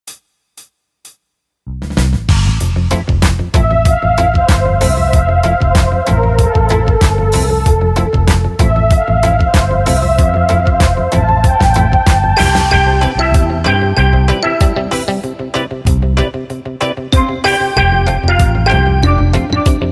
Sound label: Reggae Double Dance